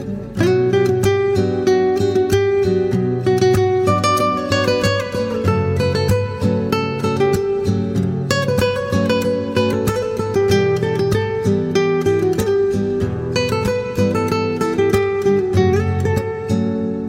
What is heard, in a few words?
Ringtone